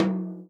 tom, Real Drum Kit, jungle kit

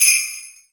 Sleigh, Percussion Kit; Akai MPC2500

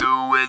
"do it", Talk Box

